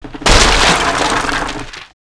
toilet; World of Tanks Blitz

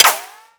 shield small

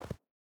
footstep boots snow jump start; Rust

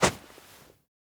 hatchet attack; Rust

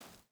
footstep barefoot stones jump start; Rust

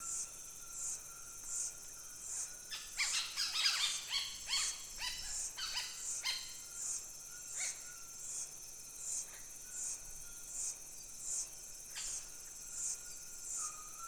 ambient tropics birds loop; War Thunder

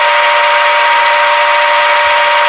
minigun spin loop; Modern Warfare

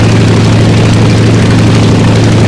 yak rear, engines; War Thunder

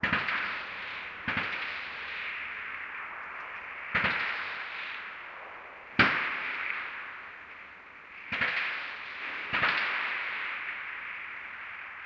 combat atmosphere; World of Tanks

